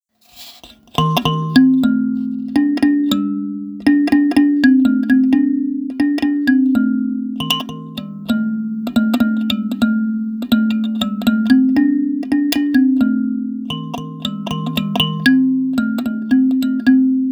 kokosova kalimba; kalimba kalabash

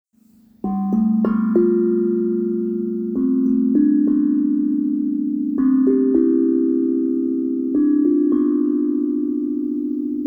nataraj, kovovy buben C dur; velky; cerny

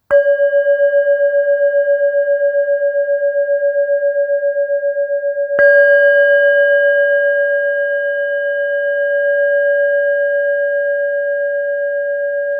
tibetska misa ishana black 277 g

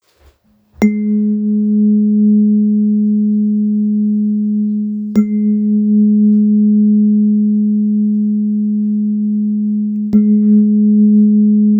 sakralni fork, terapeuticka ladicka sakralni 2 cakra 210 42 hz

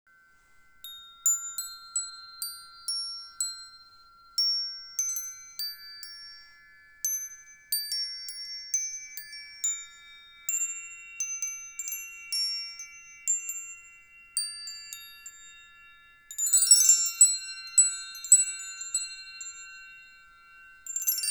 zavesne vlny kristal, kristalova zvonkohra 432 hz

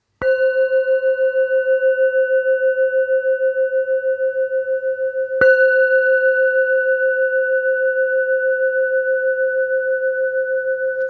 266 ish, tibetska misa ishana black 266 g

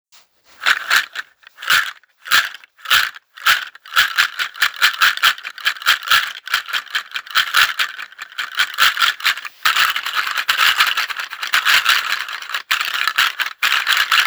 juju velké, juju ořechy s poutkem velké

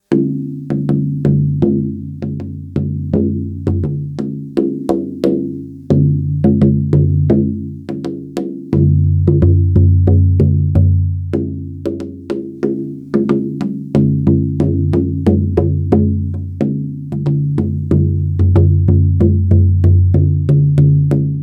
samansky buben; samansky buben 45 cm se srsti

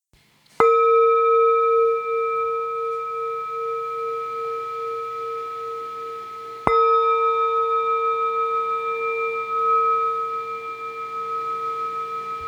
tibetská misa ishana black 347 g

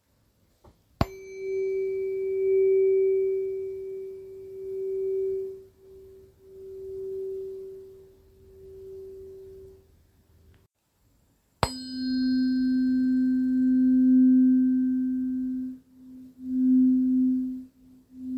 terapeuticke ladicky c g set mosaz

